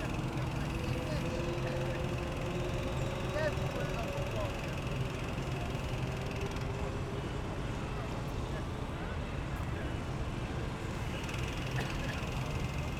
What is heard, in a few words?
rear clip